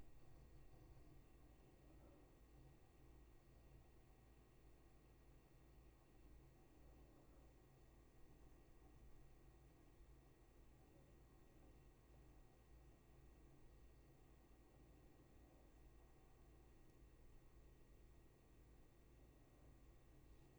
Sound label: room tone